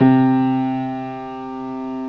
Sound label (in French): piano do3